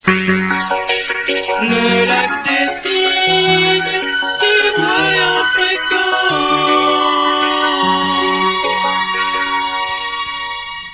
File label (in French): jingle lac cygnes